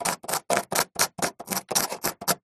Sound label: wood plank spike scratch; foley; zapsplat